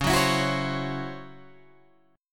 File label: Db Minor Major 7th Double Flat 5th Db Guitar Open G